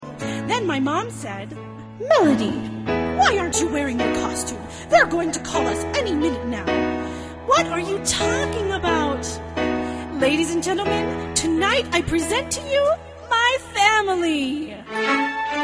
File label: melody